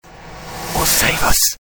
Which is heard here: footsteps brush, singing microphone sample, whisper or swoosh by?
whisper